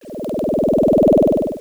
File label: alien; spelunky 1 1